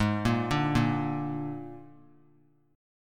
G sharp Suspended 2nd Flat 5th G sharp, guitar; G#sus2b5; arpeggiated; JGuitar

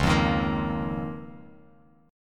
C sharp 6th Add 9th, Bass Standard; strummed; C#6add9; 9,8,13,15; JGuitar

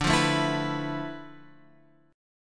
D Minor 6th Add 9th D; Dm6add9 Chord {10 8 7 9 X 7} strummed

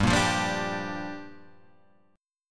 G Minor 7th

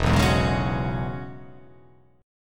E 6th Add 9th E down

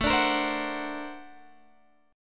A sharp Augmented Major 9th

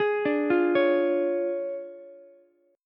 D Minor Major 7th Flat 5th Ukulele, chord; DmM7b5; arpeggiated; JGuitar

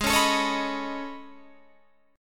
A Major Sharp 11th, Ab

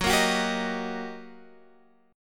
G Minor Major 7th Sharp 5th, Gb, guitar chord

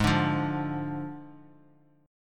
D Diminished, chord

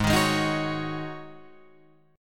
G sharp 7th Suspended 4th G sharp, Guitar Open F; G#7sus4; strummed

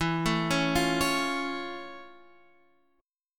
F Augmented Major 7th E

